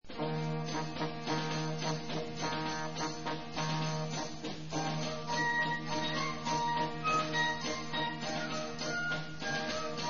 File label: Fanfare